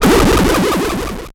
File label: Transition Gurgle Warble; Production Element